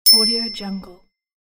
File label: ding sound effect